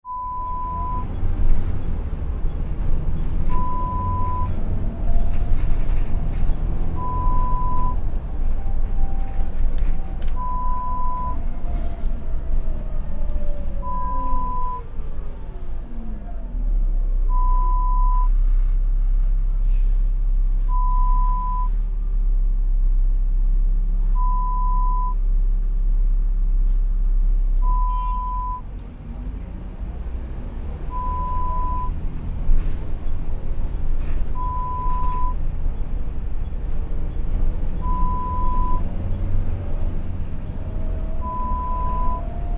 London Bus sound

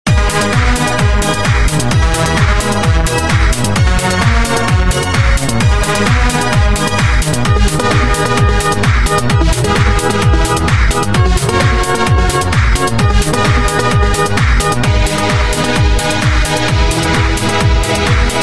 Dance House Loops

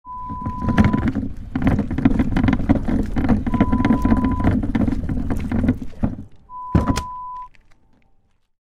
Wheelie bin

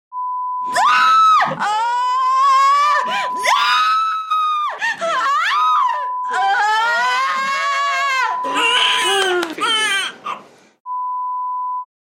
Labour Childbirth sounds

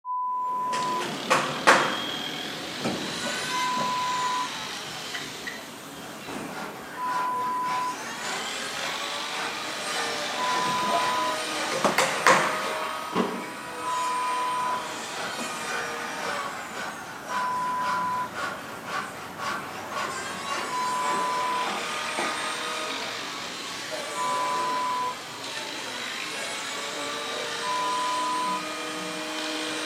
Workshop

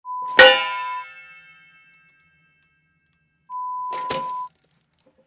Flip top trash can sound

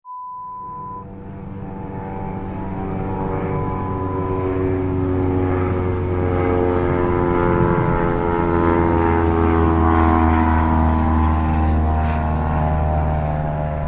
Light aircraft sound

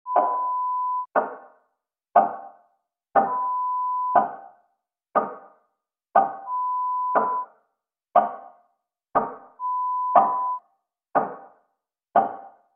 Creepy Grandfather Clock; Horror Sound Effect; Lo-Fi

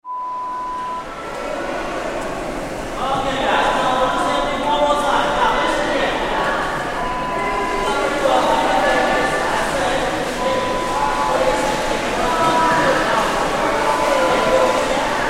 Swimming pool ambience

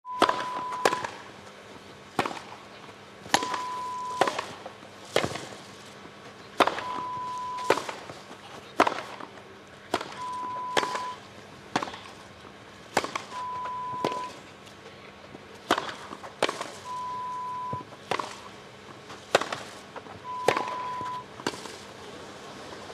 Wimbledon tennis ralley

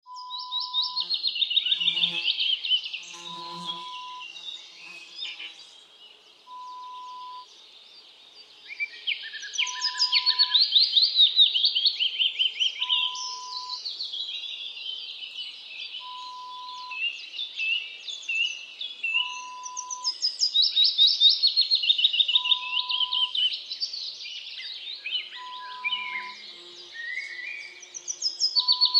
English Countryside

